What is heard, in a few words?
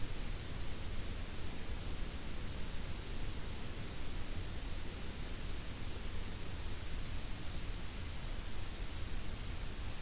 WFR audio emfisis